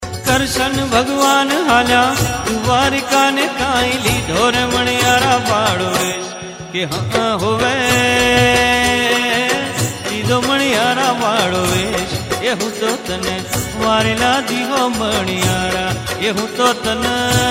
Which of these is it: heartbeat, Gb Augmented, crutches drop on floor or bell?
heartbeat